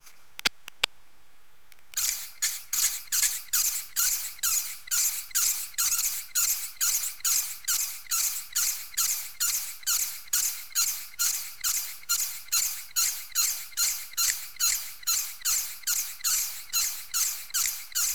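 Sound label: long form raw sound source, fluttering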